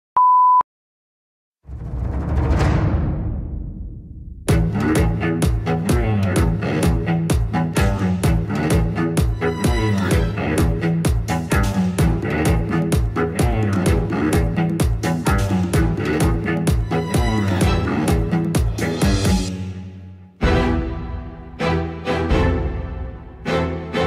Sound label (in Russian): muzika dlya xudojestvennoy gimnastiki